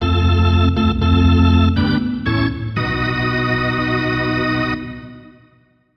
Baseball - Multiple Points; Wii Sports Club

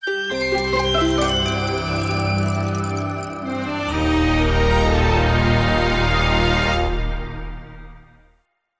Reward ~ Long; beyond good evil

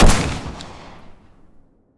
Gundog Fire

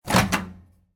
Microwave Open

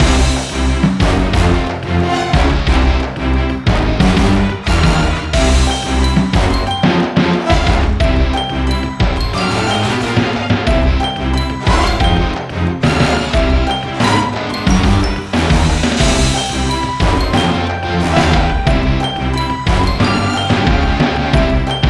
Boom! Boom! Clap!

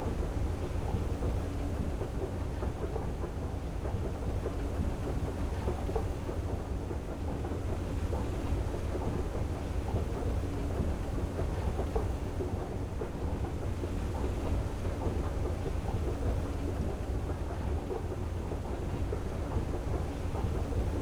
Escalator; PEAK (2025)

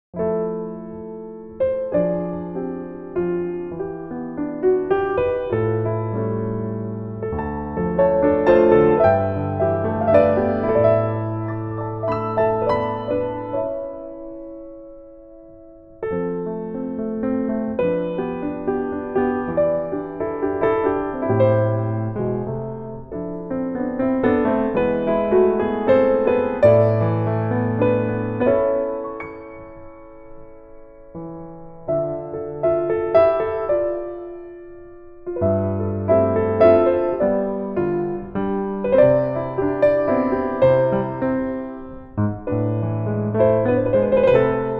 Weaving a Dream (Piano Version); Infinity Nikki; Infinity Nikki Game Original Soundtracks Vol.13

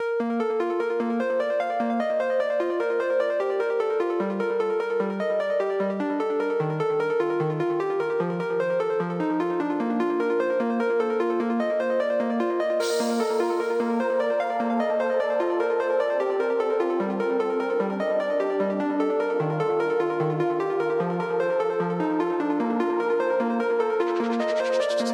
Keygen x Piracy Type Beat, Rap Trap Instrumental; Starbound; Installer Insanity

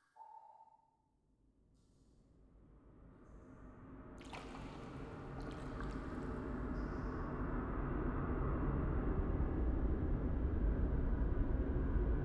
Main Ambience; Bendy: Lone Wolf

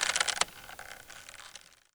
Rope Creak

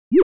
SFX - Menu Back; uno

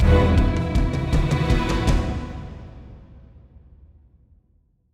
Combat Area B1 Trans1to2 160 Em

